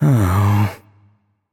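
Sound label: nonverbal sigh; voicepack; smite; merlin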